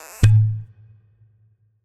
cork

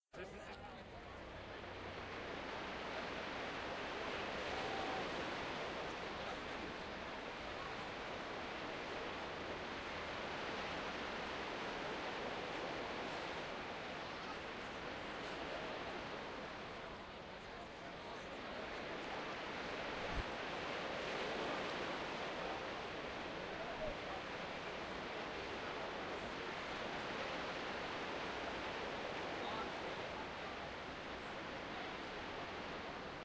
waves n crowd

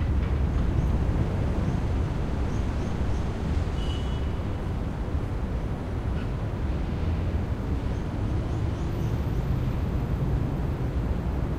citynoise

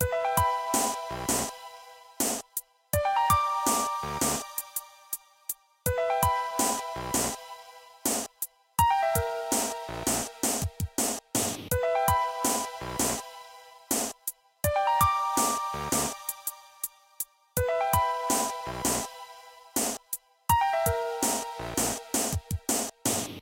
spiral Mystery

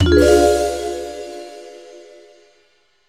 wake up

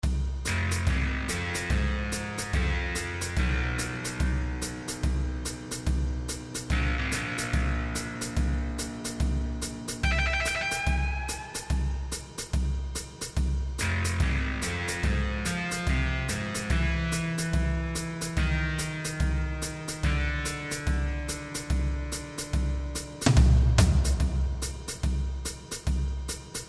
sneaky

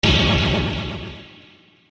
boof

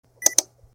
click

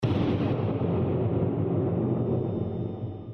oomph

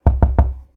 knock

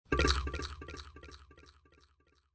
chomp

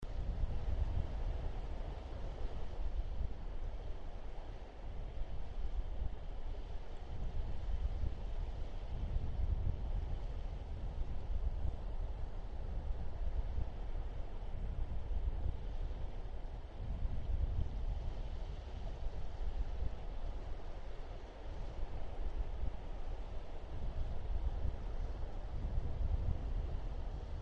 wind

